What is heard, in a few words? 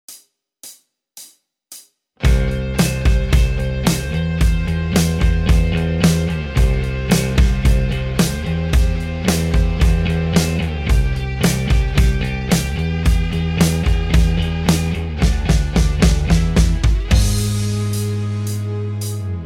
Acoustic backing; Guitar Techniques 2018 Issue 280 April